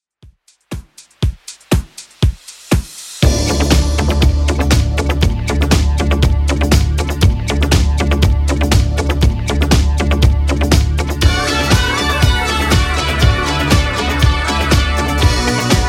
80s Redrum 120bpm

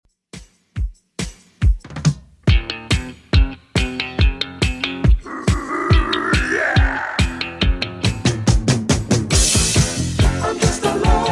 70s Redrum 140bpm

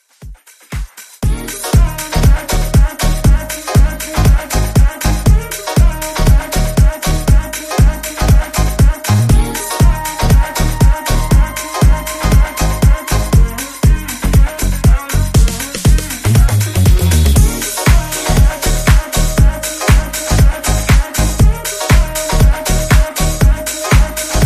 Top40 Redrum 119 Bpm

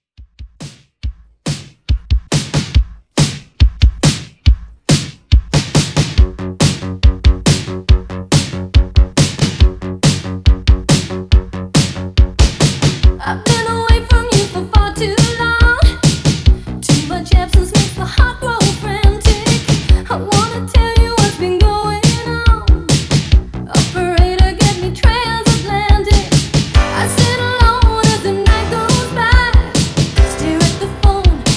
Re drum

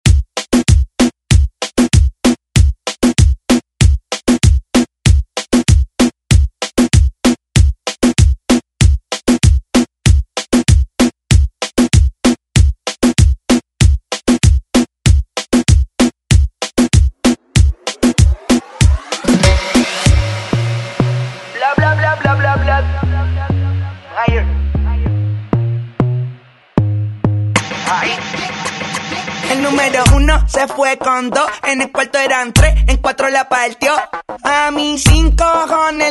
Drums ReDrum; DJ Mhark

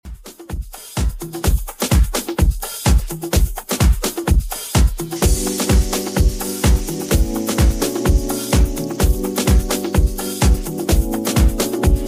2ks Redrum 127bpm

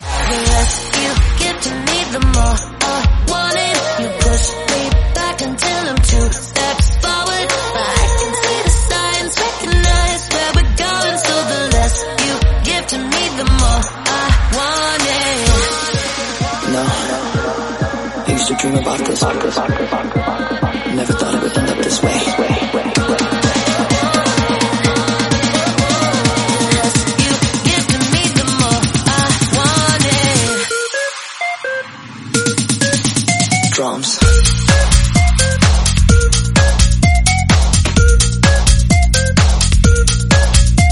Drums 128bpm Bbm

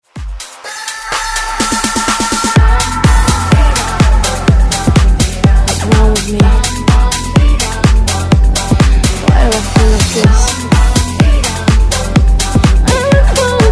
house redrum

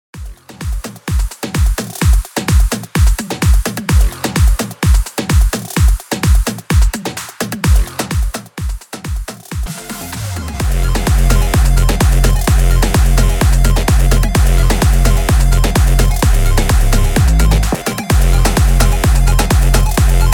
Bigroom Bootleg 128bpm

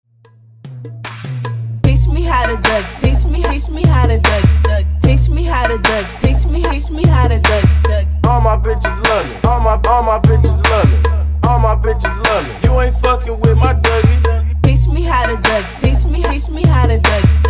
Talkin Bout Dougie Breakz Dirty 75Bpm

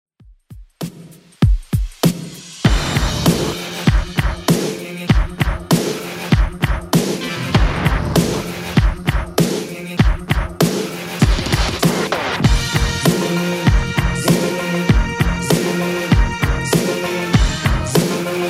80s Dance Redrum 98bpm

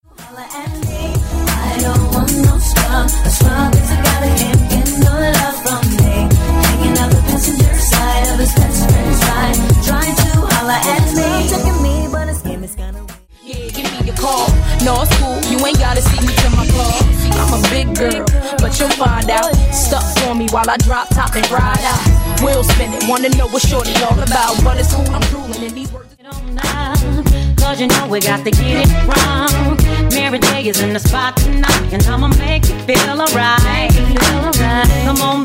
Throwback HipHop RnB Megamix

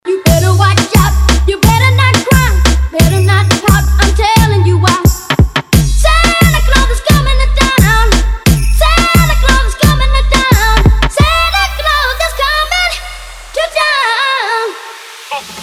Classic 70s Christmas Rework

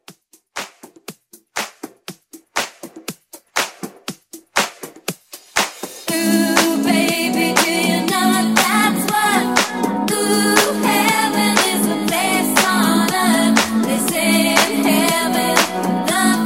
House Mixshow Blend

